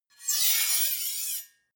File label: two knives large scrape squeak